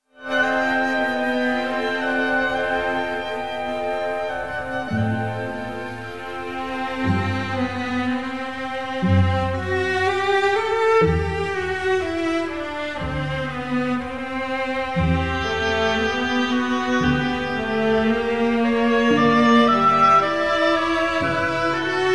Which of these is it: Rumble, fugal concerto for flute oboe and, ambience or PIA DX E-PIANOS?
fugal concerto for flute oboe and